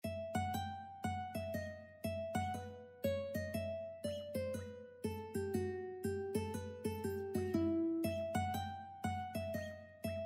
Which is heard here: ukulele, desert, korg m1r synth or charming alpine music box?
ukulele